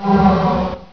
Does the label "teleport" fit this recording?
Yes